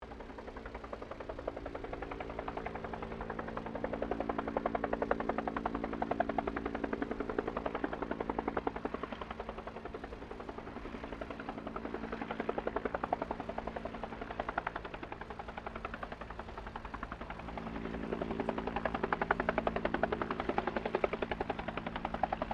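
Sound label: helicopter Bell UH1 Iroquois distant; RAN UH-1 Iroguios; Helicopters (Sound Effects:Vehicles)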